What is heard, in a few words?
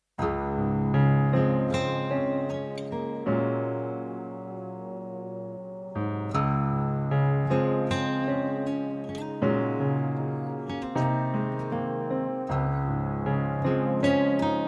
Acoustic Vibe, jazz slow emotional acoustic guitar piano; suspense atmosphere